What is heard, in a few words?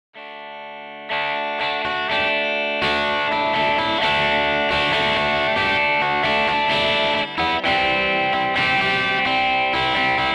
freq in the middle